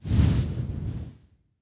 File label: card shining